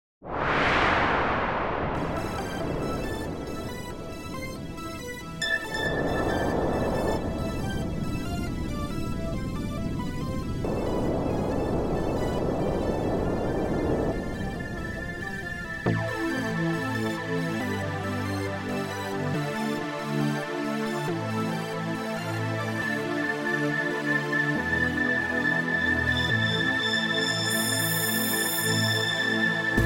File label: Techno